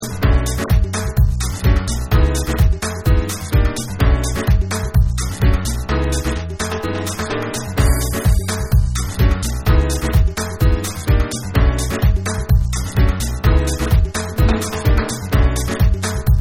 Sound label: Piano Tool